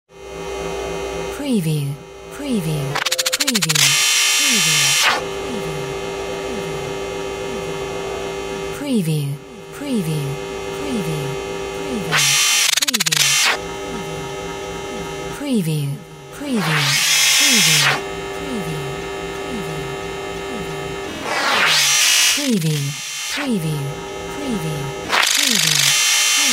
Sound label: SCIFI MECHBOT ANGER